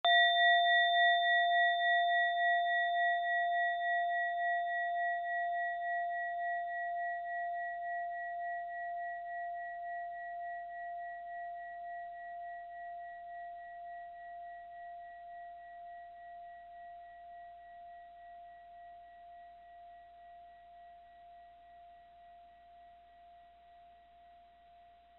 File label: Small Bell